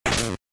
touch spike

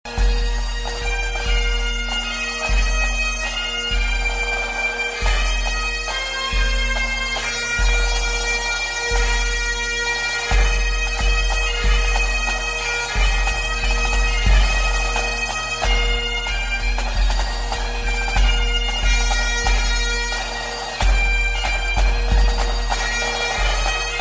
Bagpipe Scots Wha Hae; Bagpipe Favorites

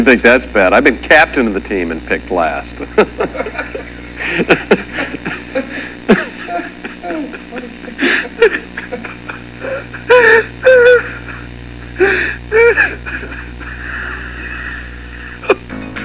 CRYING

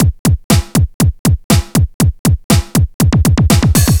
filter

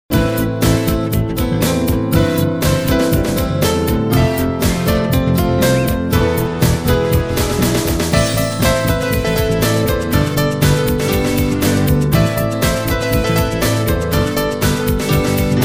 Sambaya

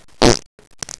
fart

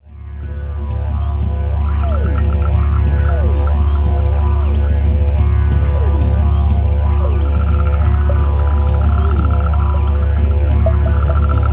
didge

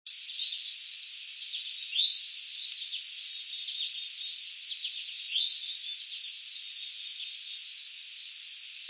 goldfinch; birdsongs

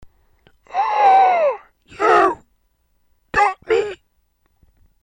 death

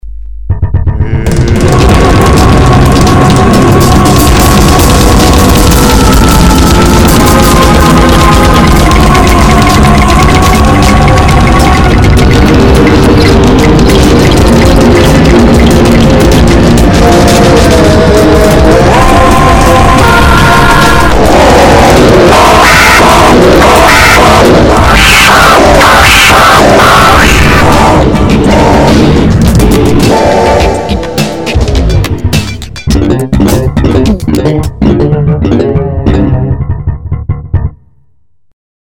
zouk

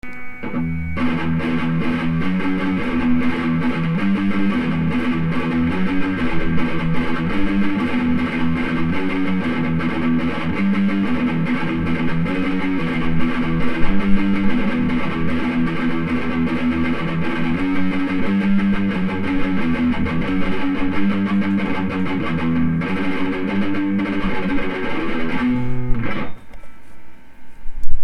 Riff

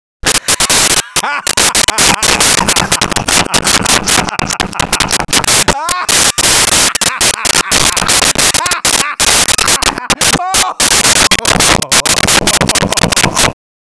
Laughing

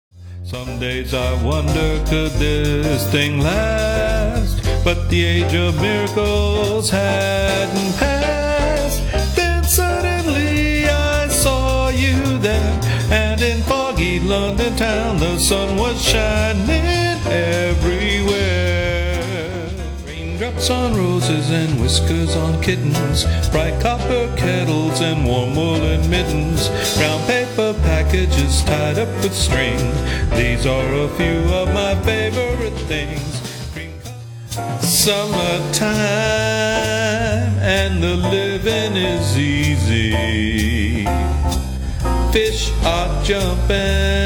vocal

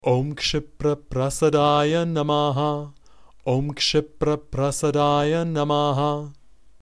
Om Kshipra Prasadaya Namaha, sanskrit mantra

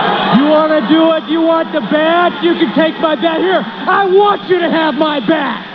bat

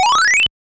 lv up

